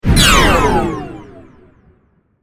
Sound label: Laser Burst Power, Laser, Burst, Power, Down, Science Fiction; 5.1